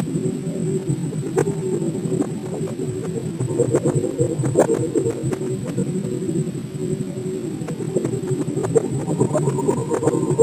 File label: digital distortion; Titanfall 2